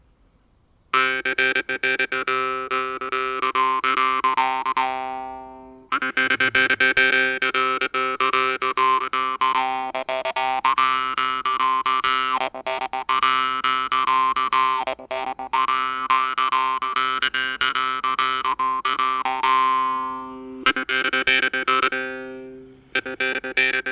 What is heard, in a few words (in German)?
Tremolo